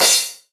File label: Crash, Synthesized; One Shots; Crash Cymbals; Atrocity; Ghosthack - Drum Hero 5